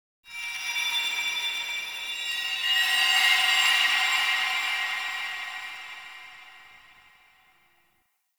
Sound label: Glass Particles; Warframe; Ambience; Nokko Colony